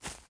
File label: fs grass, footsteps